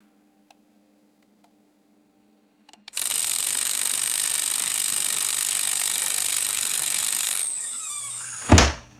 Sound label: mechanical timer ticking and clicking off